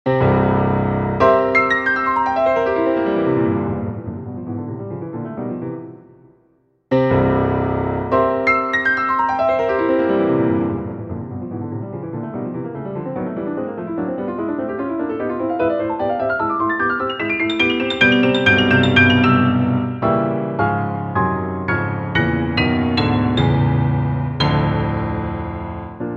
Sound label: etude piano; classic; liszt; etude01